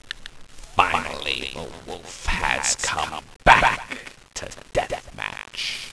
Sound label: wolf; deathmatch